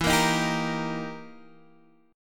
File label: E Minor Major 7th Flat 5th, E, down, Guitar, Open D